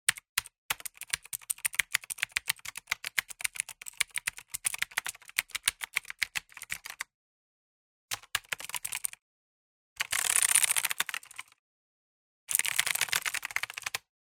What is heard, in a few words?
Keyboard Typing; noise creations